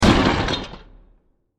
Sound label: Enormous Impact With Various Wood And Metal Particles; Blasts